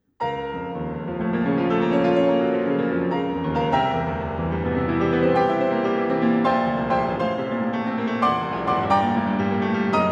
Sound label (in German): Klavierstück